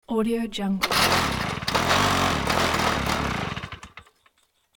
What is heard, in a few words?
motor wont start